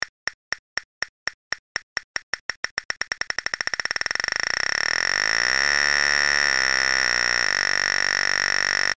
Harmonic Series - pitch frequency

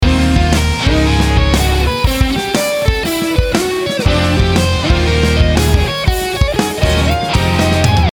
contrasting 4ths and 5ths

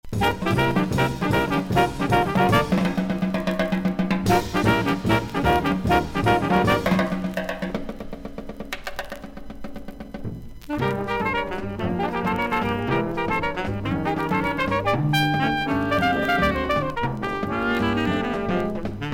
jazz

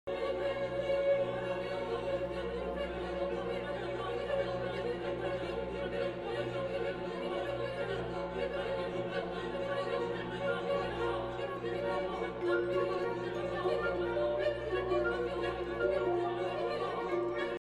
Clocks And Clouds; Ligeti

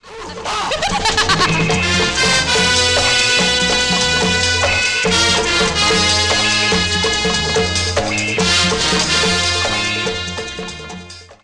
Melody

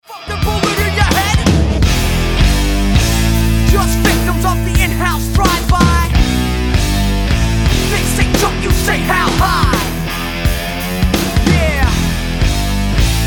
Guitar riffs

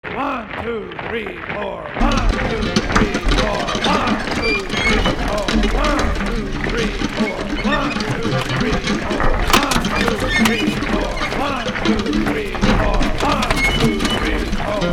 grrr

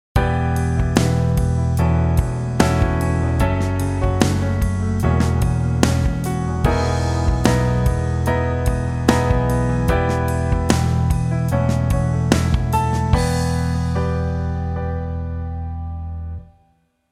modal interchange

